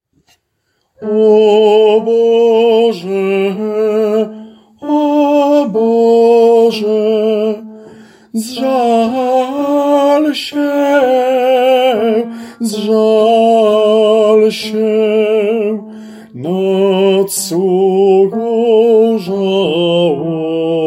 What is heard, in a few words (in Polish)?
O Boze TENOR